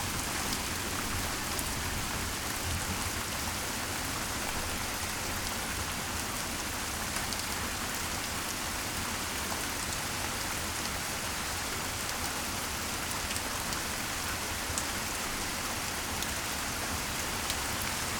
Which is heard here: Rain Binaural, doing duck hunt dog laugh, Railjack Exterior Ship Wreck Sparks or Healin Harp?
Rain Binaural